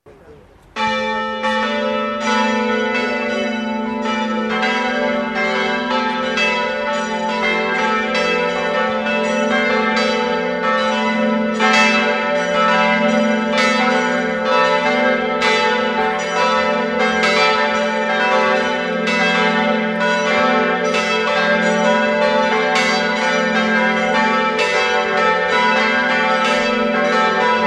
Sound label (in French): cloches enterrement